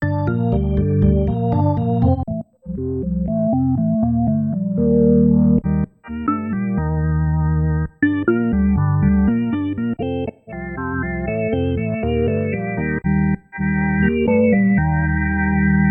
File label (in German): kurzweil k2700 soundbeispiele orgeln